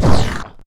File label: pain; player